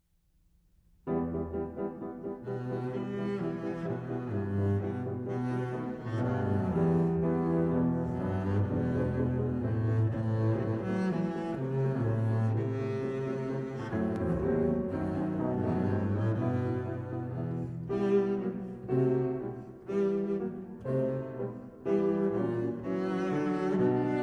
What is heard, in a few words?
Allegro di concerto; alla Mendelssohn